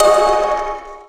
SAND, Drum Machines, Akai MPC2500

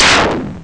blast; Amiga Soundtracker Sample Packs

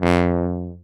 BARITON; Best Service; Alpine Volksmusik

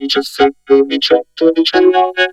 Vocode; Choir

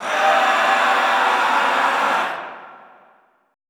LAST SCREM, Classical Choir; Best Service; Extended Classical Choir; CLUSTER

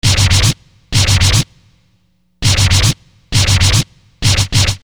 Scratch, Hip-Hop; Construction Kit; Sonic Foundry (Sony Creative Software)

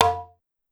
Conga Hi Slap, Pitched Up; Clavia DDrum-AT

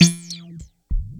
Bass; Sonic Foundry (Sony Creative Software); Twine-IDM; Basses

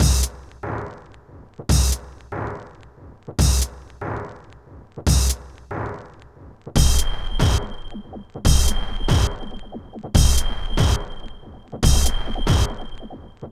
Method Machine, 142 Cmin, Hardcore Breakbeat; Big Fish Audio - Hardcore Breakbeat Action

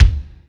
LOW BD, DRUMS; East West; Global Sounds

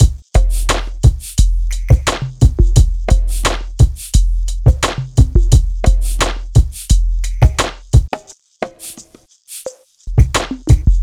Drums, Hip Hop; Sonic Foundry (Sony Creative Software); Lukecage Underground Soundlab Hip-Hop

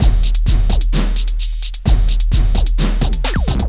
drum 130 electro, drumloops; Techno Trance Essentials

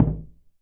KOO KU M, KOREAN DRUMS; AKAI S6000 CD-ROM; WorldSounds

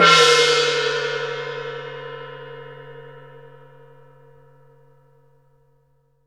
GONG; ILIO; Synclavier Percussion 2; World & Orchestral

